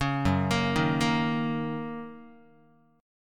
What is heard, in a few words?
Gb 6th Gb down Guitar; Listen to Gb6 arpeggiated